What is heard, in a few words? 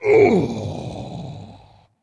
Wound Crit; Captain Rupert